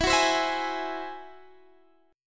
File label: Ab Augmented 7th E, guitar